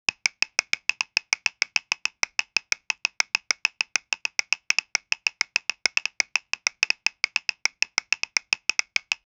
wooden rhythm sticks tapp, regular

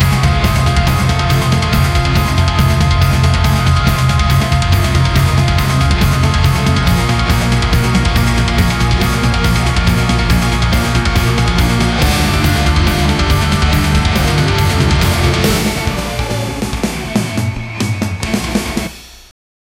tense dark mood but fast

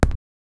the sound of a kickball, bouncy

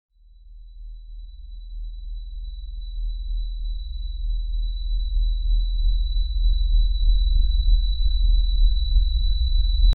overloading rune pulses, energy

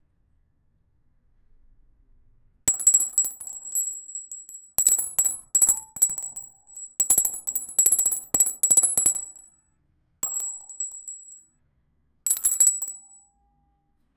sound of golden metal, coins

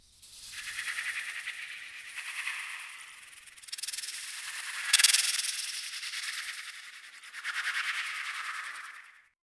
the sounds of hundreds of, ants

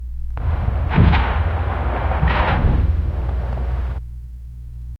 soft rustling of parchment

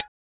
percussive

